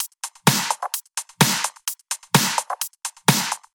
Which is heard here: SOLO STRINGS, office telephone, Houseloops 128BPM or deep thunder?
Houseloops 128BPM